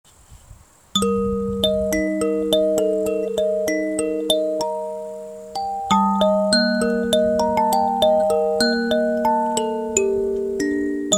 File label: 15tone Gminor kalimbas; Phakali; forest fairy; Bolf kalimbas